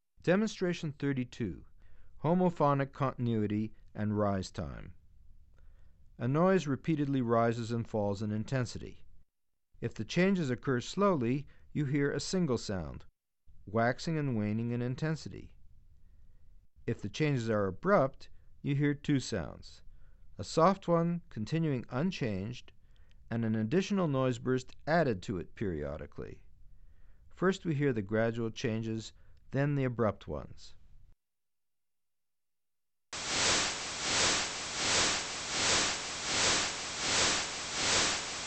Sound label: Homophonic Continuity And Rise Time; Bregman ASA; auditory demonstrations